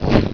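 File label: flame shot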